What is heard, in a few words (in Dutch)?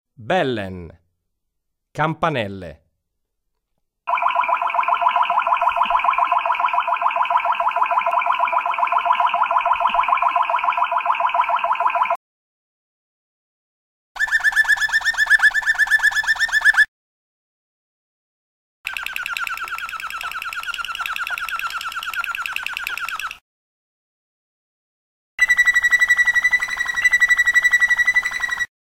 Bellen, waterslager